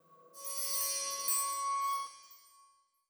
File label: plant